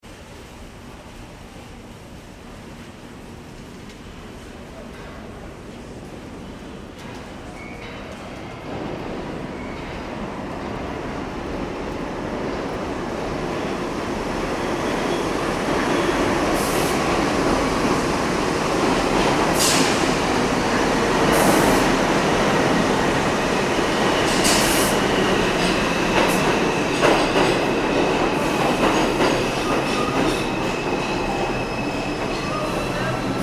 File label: ambience train station subway trains people; Subway Train Station ( New York City ) Ambience - Trains And People; Subways; Vehicles; McKinney Sound